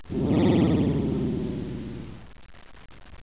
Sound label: amaze